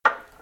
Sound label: Platform move gear; Puddle; puddle plus ios gamerip 2018